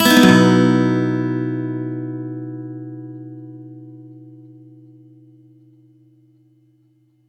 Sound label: One Shot B add11 upstroke, Acoustic Guitar; Sonic Foundry (Sony Creative Software); Songwriter Acoustic Guitar Companion